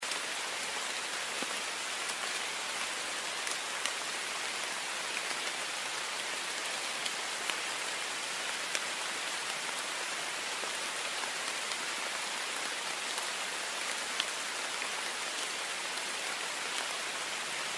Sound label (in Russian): dogd bez groma; шумом дождя, падающих капель